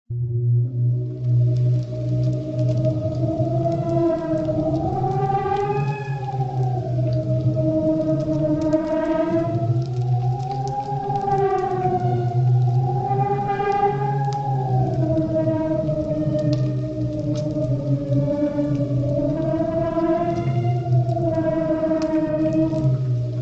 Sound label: bats